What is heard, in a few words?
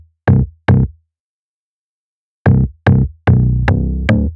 Smooth Dist; Бас сэмпл (Ритм-н-блюз); Rnb, Soul; 110 BPM; Bass